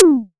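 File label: SYNTH DRUM, PERCUSSIVE; AKAI S-Series CD-ROM Sound Library VOL-8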